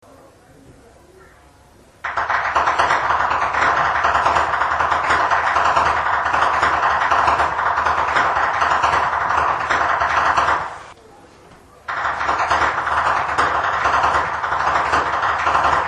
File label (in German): schnalzen, flachgau; bergheim